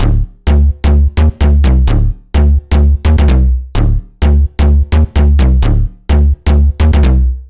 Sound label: Synth Loop Dm 128